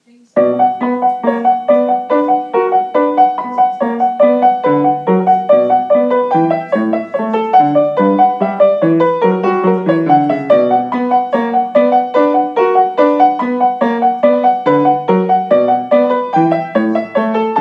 bach minuet

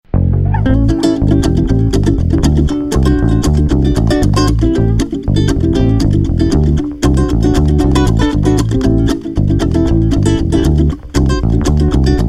groove is in the uke

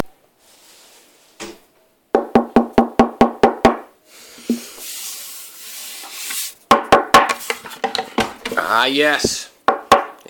knock on wood

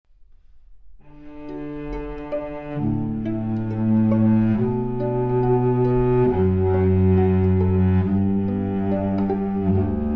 Canon in D, String Quartet; Toast String Quartet; strings

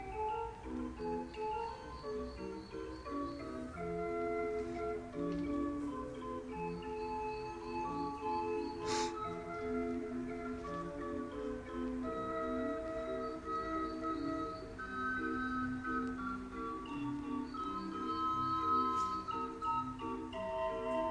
organ man

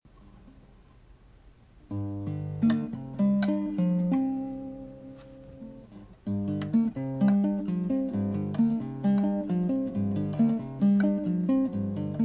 two keys continuo

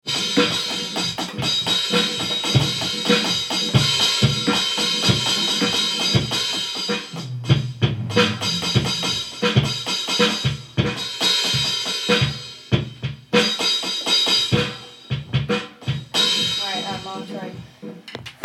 live drum recording

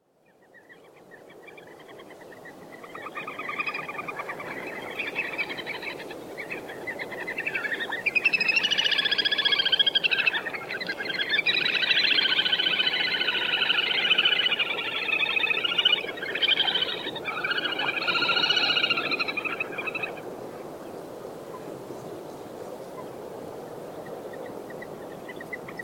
little grebes laughing

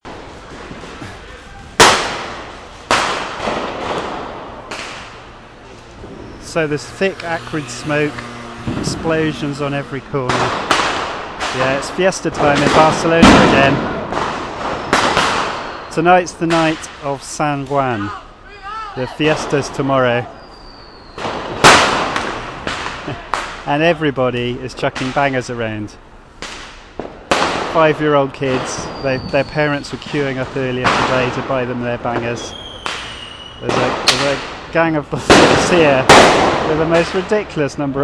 small children with fireworks

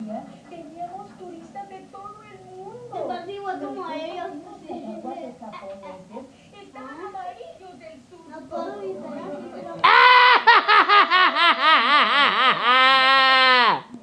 laughing

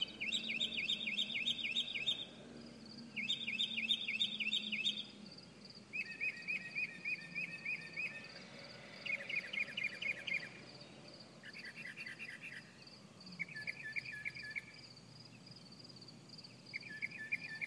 the birds chirping

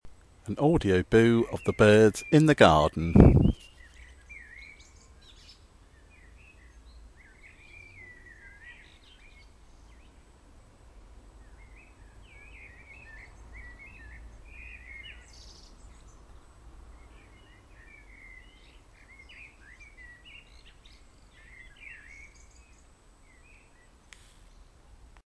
garden birds

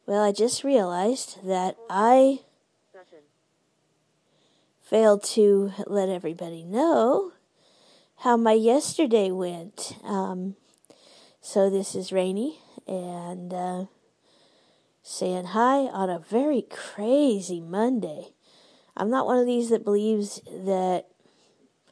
singing in the rain

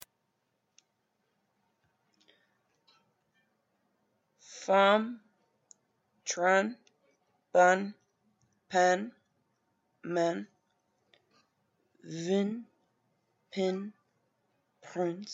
nasal sound

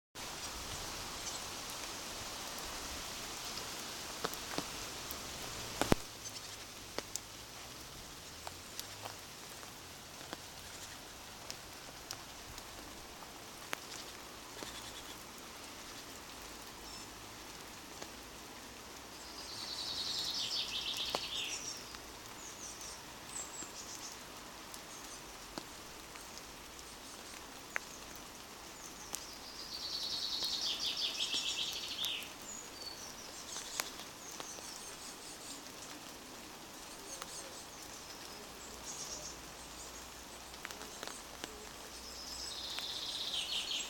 dawn chorus

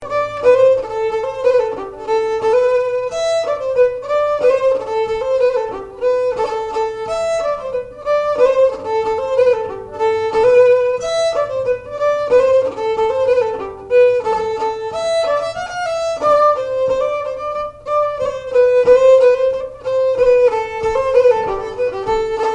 hanter dro 2 harmony

